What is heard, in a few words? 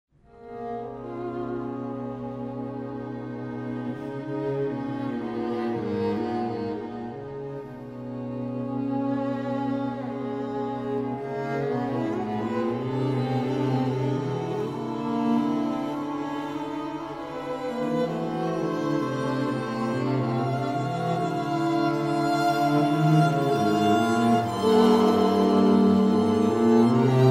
String Quartets